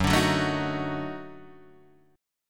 D sharp Minor Major 7th Flat 5th F sharp

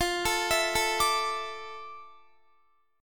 F Major 13th Guitar; arpeggiated; JGuitar

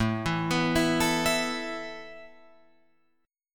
A Suspended 2nd A down Guitar Open F; Asus2 arpeggiated

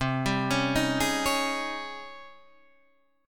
G sharp Major 11th C

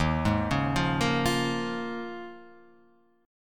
D sharp 9th Suspended 4th, Guitar; arpeggiated; x,x,1,1,1,0,0,2; JGuitar

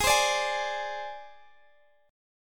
Ab Major Flat 5th

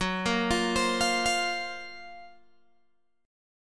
F sharp Major Sharp 11th F sharp Guitar Open G

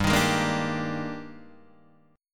Eb Major 11th G, guitar

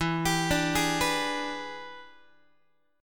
E 7th Sharp 9th Guitar, chord; E7#9 arpeggiated; JGuitar

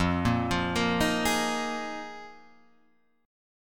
Eb Suspended 2nd Suspended 4th

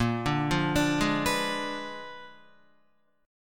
Bb 7th Flat 9th Bb down Guitar; Bb7b9; arpeggiated; JGuitar

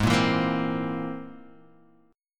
C Augmented 7th G sharp

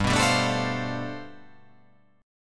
G 11th

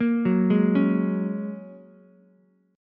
Ab 7th Suspended 2nd Suspended 4th, Ukulele; Ab7sus2sus4 arpeggiated; 3,6,4,4

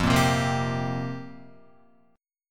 F Suspended 2nd Suspended 4th F down Guitar; strummed; JGuitar

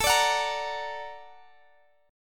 A sharp Augmented Major 7th A, guitar

